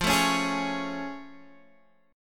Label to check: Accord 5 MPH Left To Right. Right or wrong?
Wrong